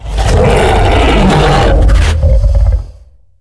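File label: krayt dragon; Star Wars Knights Of The Old Republic I; XBOX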